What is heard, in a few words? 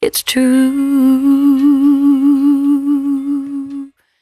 113bpm C#, Velvet Vocal; Zero-G